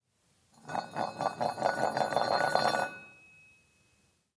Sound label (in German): Metallstueck